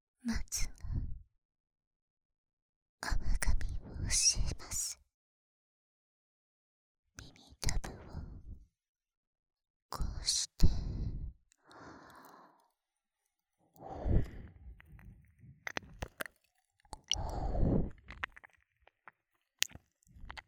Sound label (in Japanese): あまがみ・耳舐め, ASMR